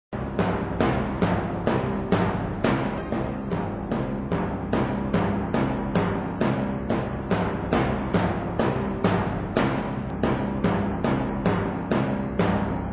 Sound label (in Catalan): tambors egipte